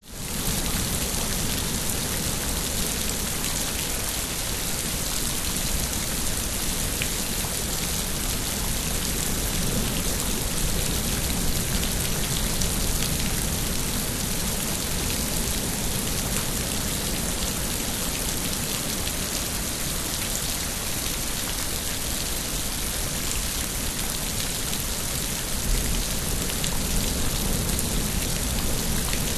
rain heavy on concrete street very distant ocean bali indonesia; lukas tvrdon